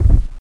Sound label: bulf Walk; NETSTORM